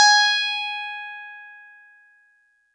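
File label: Easy Clav DX100 G#1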